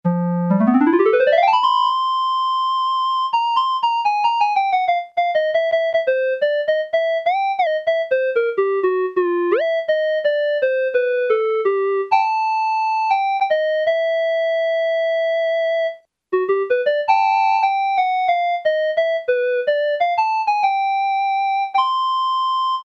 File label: CLARINET, Yamaha SHS-10